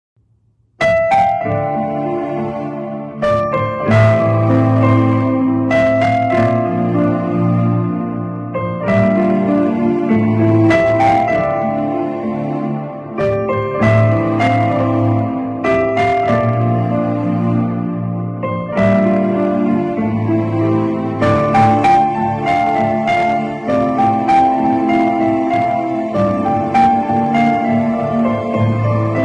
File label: love bgm ringtone; happy valentine s day